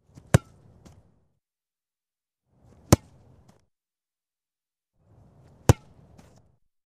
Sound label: Football, the goalkeeper catches the ball